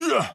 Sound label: Attack Medium